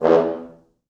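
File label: 4 French Horns, Horns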